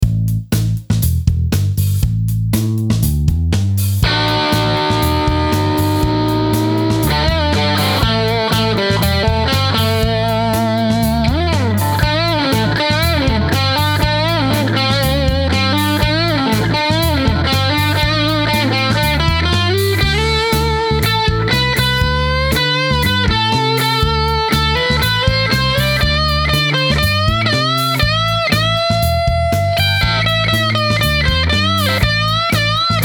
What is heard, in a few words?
58 dirty lead, gibson les paul; Gibson Les Paul 1958 Gloss Standard Reissue Tea Burst